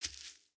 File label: Foot Grass Walk, footsteps